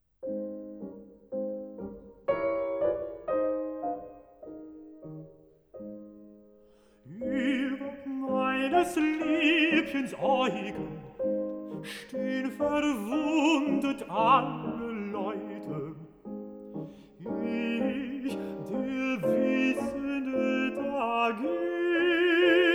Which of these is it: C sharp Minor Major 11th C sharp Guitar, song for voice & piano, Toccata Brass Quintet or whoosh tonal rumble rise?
song for voice & piano